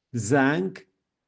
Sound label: bell